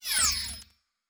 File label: Device Stop, Electric